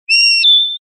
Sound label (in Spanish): queja; toli; pardillo